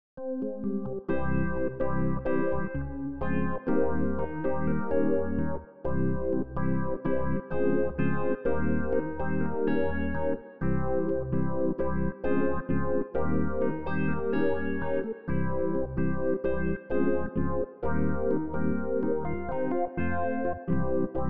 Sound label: Rhodes Phaser